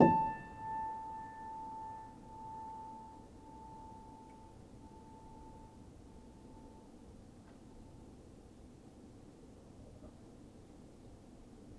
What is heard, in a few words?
Player, Upright Piano; HSS OP Pack; healing soundscapes